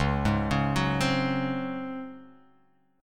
Db Major 7th Suspended 4th Db down

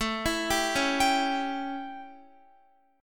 A 7th A, Guitar; A7 arpeggiated; x,12,14,12,13,14; 4E,4A,5D,5G,5C,6F

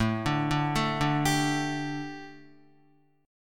A 7th Suspended 4th, A, down, Guitar; A7sus4; arpeggiated; JGuitar

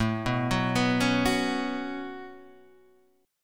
Db 7th Suspended 4th Sharp 5th

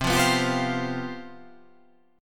C Major 7th Suspended 4th

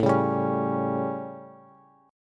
A 7th Bass, chord; strummed; JGuitar

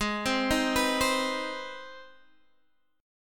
C Minor Major 7th Sharp 5th Ab

